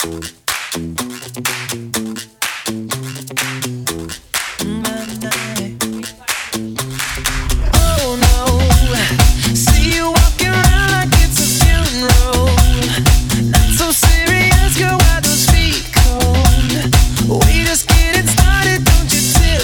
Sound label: Cha Cha Cha